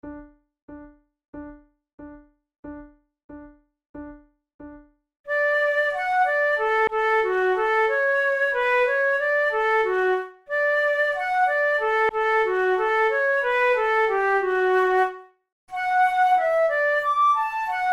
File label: duet